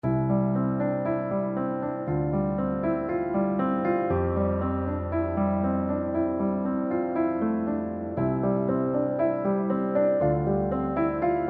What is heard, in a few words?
Cinematic Piano; Pianostory